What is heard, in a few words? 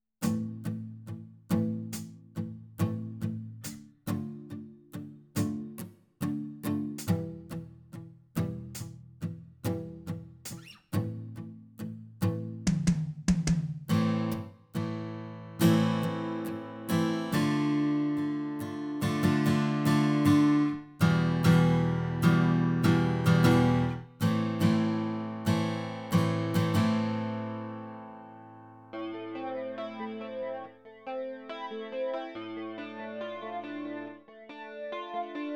Flanger; STA